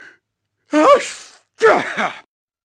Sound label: Sneeze Estornudo